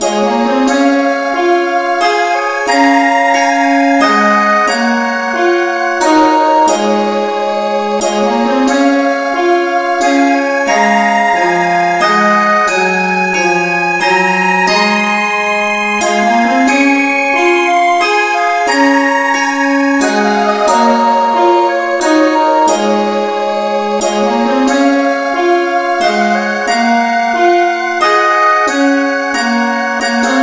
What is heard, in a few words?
no vocal key cis